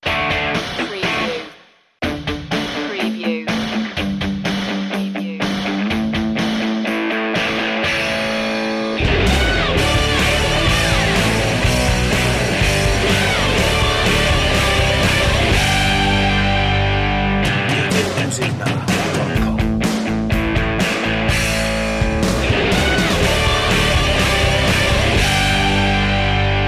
garage band

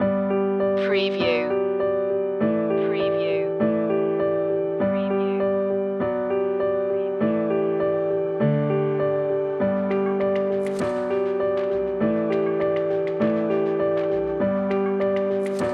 no guitars

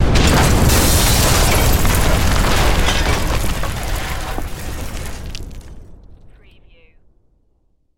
Explosion Building; Sound Effects Bible

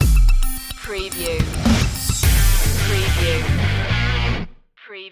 beat on fire stinger

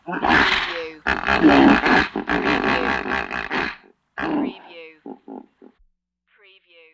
Hippo Grunts Loud Vintage

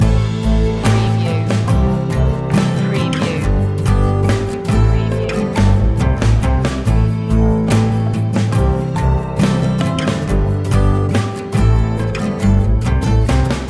mellow guitars loop

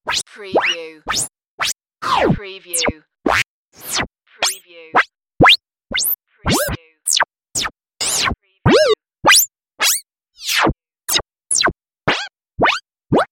space game sounds

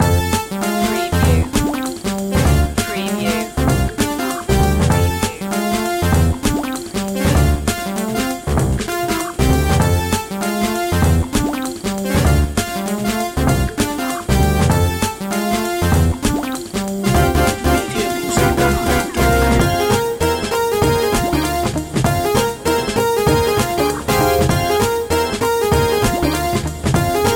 retro video game sweet action long looping

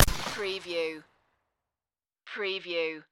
Shot Gun 12 Gauge Single Shot Exterior At 45ft; Magnum Eagle Arrms

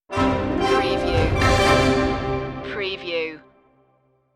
eastern harbour stinger

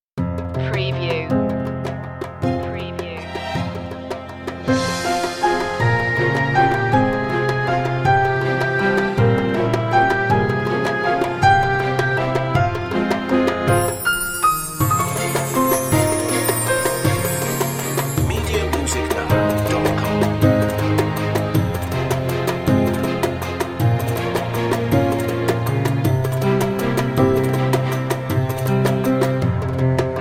world valse no leads

